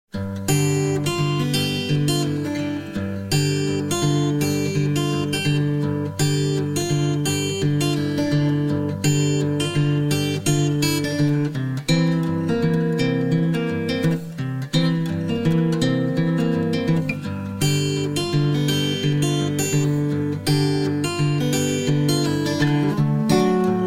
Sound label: blues acoustic Harmonica Blues, music on hold